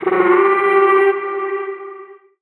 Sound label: vocal stretch, Gm; SD AURORA Sample Pack; Slate